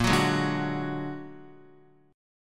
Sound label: Bb Augmented 7th Bb down Guitar 4E,4A,5D,5G,5C,6F; Bb+7; strummed; JGuitar